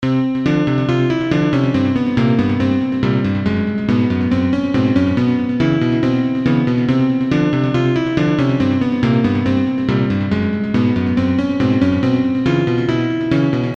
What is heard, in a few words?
Chthonic harmony demonstration